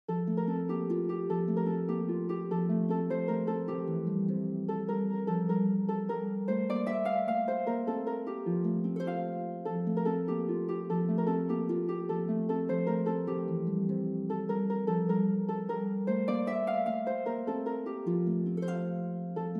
harp; hey diddle diddle

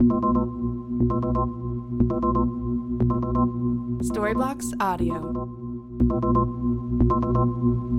Ambient House Arp Pad 120BPM C

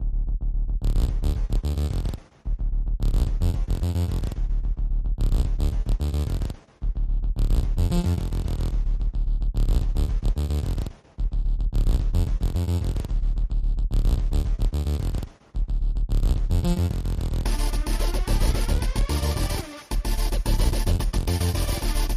House Synth 110bpm

